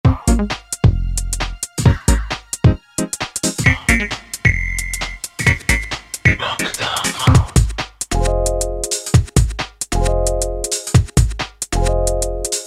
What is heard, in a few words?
Garage, Yamaha DJX-IIB